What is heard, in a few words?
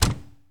Lamborghini Door Close, Automobiles